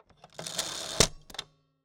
Typewriter

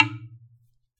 Wooden Bar Hard Strike

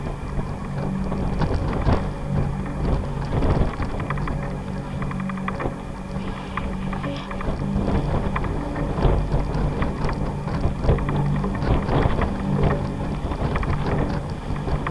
Sailing Noise Only

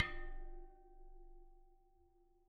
Bicycle Spoke

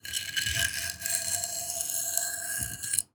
Wooden Bar Wire Brush

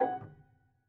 Prepared Piano

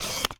Camera Single

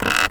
Polystyrene Creak

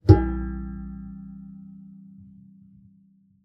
Classical Guitar Extended Technique

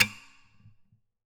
Classical Guitar Scrape; Classical Guitar Extended Technique Stereo

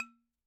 Bottle Struck

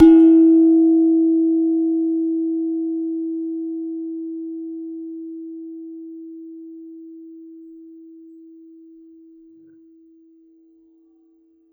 Gender, Gamelan

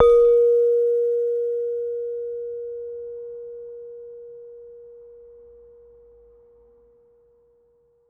Saron D, Gamelan

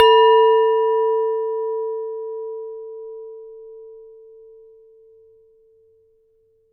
Hard Hit, Large Wine Glass

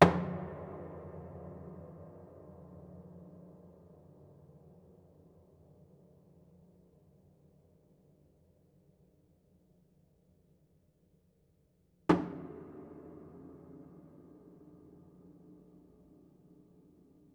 Body Thump Ped Sparse, Piano Destruction

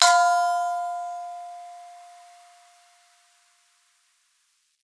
Bonang Para EQ, Gamelan

